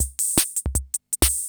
CR78

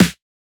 Snare, Dance Drum Kit; 99Sounds x Monosounds; Twilight

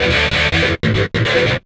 GTR, Chemical Beats

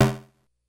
Snare, Korg DS-10

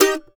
MANDO MAJ, D# MAJ R; Sampleheads; Whole Lotta Country 1 & 2

